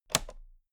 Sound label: toolbox latch close heavy